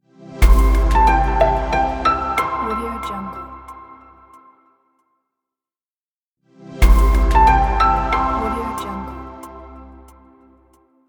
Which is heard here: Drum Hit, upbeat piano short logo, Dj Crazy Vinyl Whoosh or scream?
upbeat piano short logo